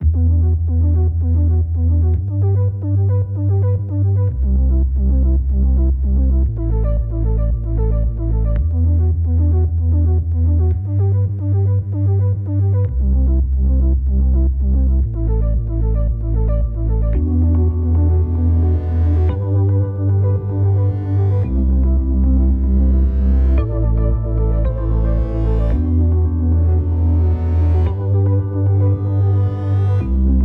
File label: Wide Water without click 112bpm